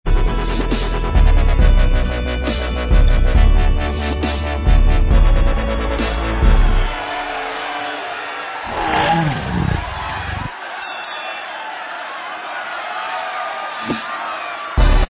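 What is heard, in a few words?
Inst Track, instrumental track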